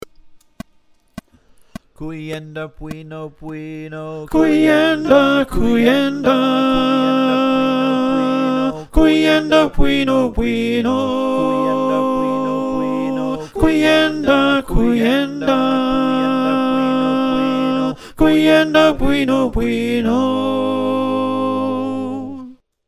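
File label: alto, choir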